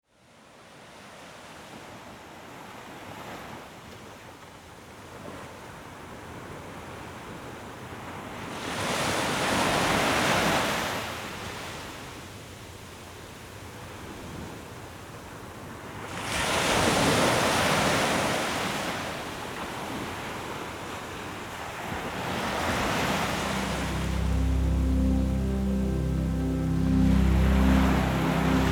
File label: Relaxing Music of Cosmic Energy and Ocean; Cosmic Energy with the Ocean; serene, meditative state; Inner Harmony